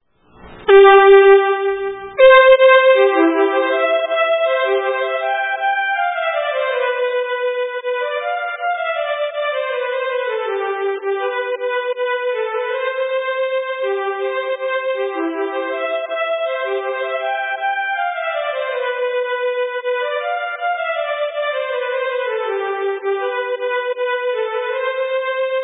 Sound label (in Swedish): marschen